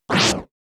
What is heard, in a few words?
Roland Juno D GM2 SFX Kit